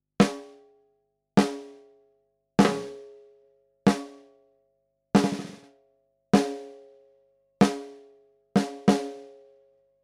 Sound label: goldmike mk2 sm 57 snare air med